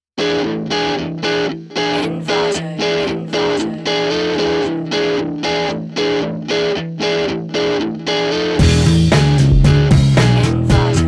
breaks blues

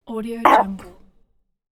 disgruntled doggie

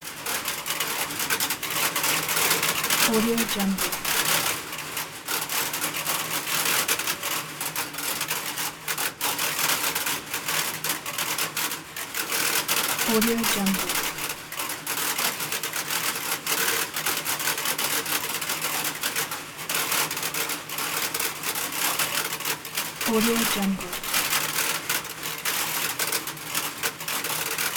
telephone relay room light voices

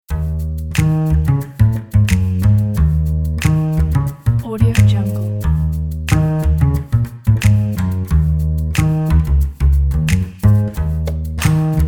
funk

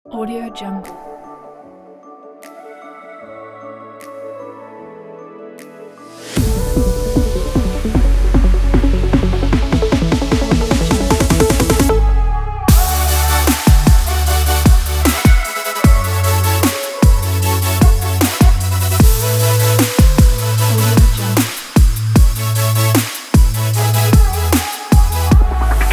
vlog future bass; karma-music

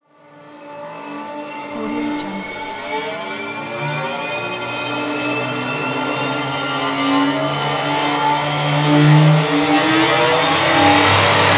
alarm danger and hit

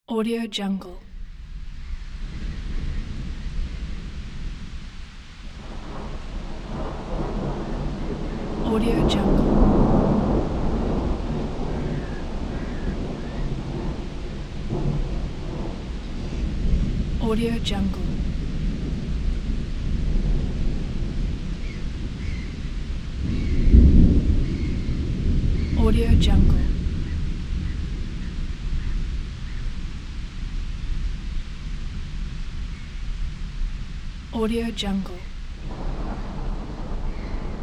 thunder on lake

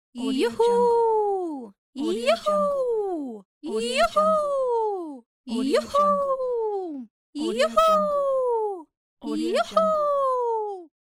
girl success shout

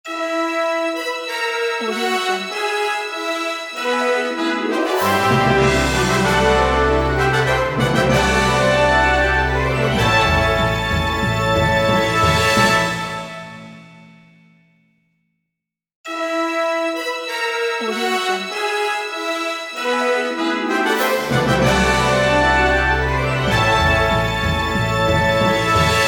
christmas fanfares

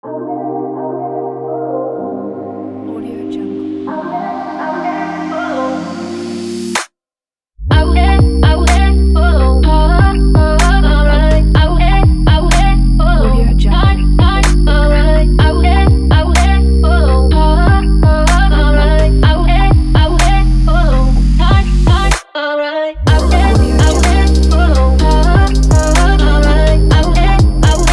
beauty vlog

